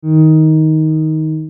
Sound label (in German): Gitarre, Wenn das fehlt, hört man keine Gitarre mehr! Es klingt fast wie ein Holzblasinstrument.